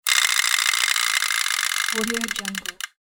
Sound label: cartoon teeth chatter